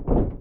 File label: Panel Safe Spin; Among Us